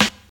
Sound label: 90s noisy rap acoustic snare sound g sharp key